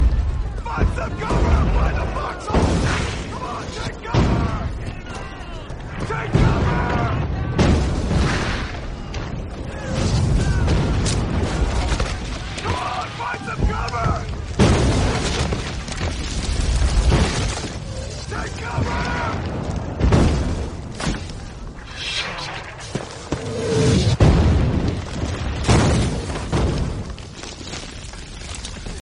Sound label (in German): lustiger lauter deutscher klingelton